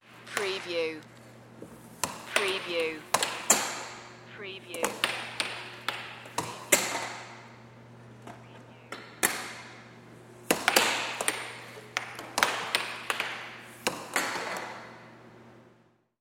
Air Hockey

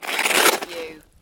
Snow and Ice, Ice slide pass by

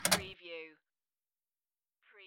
Pinball sound, up and down clank

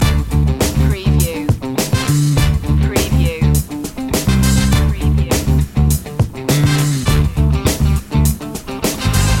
soul beat loop

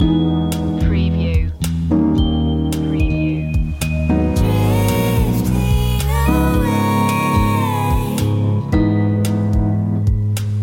drifting away loop

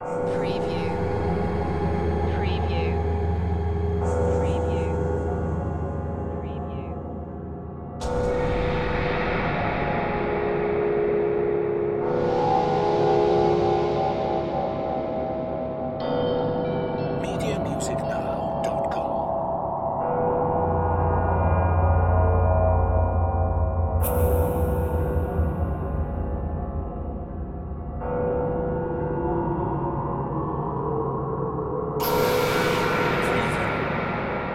Clock Chimes Eerie

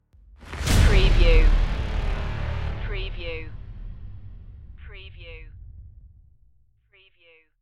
hybrid trailer stinger

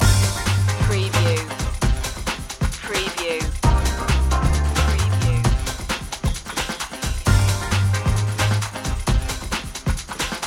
funk head loop

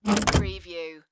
Door Handle Turn

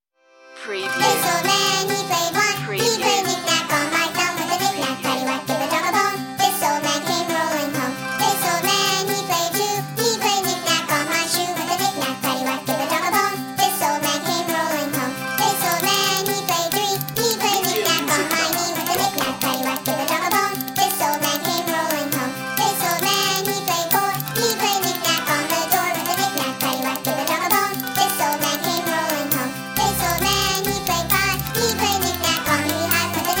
this old man chipmunk vocal

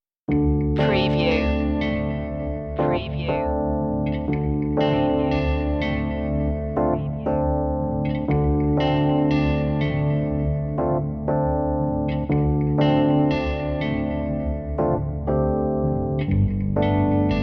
el piano guitar

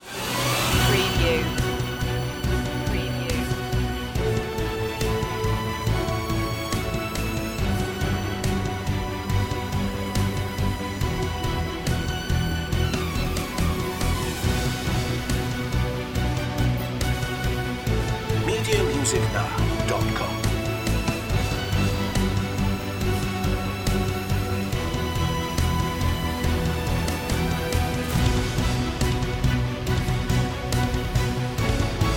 no choir

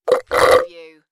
Straw suck; beanstalk audio

